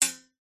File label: metal spring ping tight; foley; zapsplat